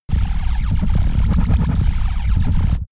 space laser blaster massive damped; krieg-waffen; soundpool

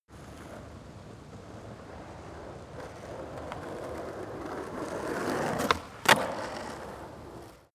skateboard single drive by jump small

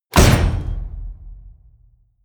wham, whooshes

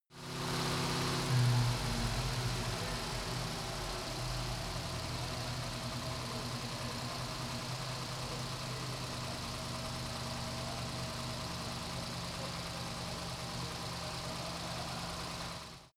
car camaro slow down engine

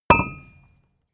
metal tool drop massive; berufe; handwerker; soundpool

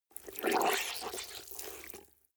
clothes water wring hard

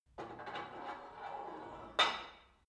put light weight onto barbell soft, fitnessstudio; sport freizeit

